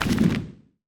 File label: Comic UI Button Page Prev